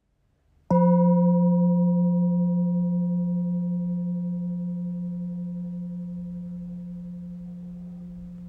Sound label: 172cm 618g F90Hz